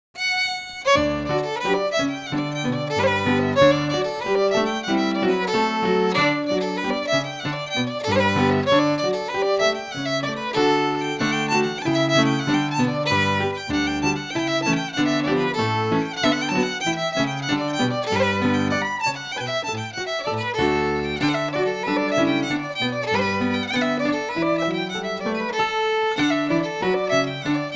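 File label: Reel, Scottish Fiddling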